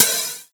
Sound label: HiHat Open Hard Electro